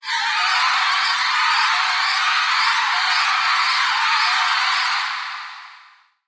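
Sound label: sounds of scary voices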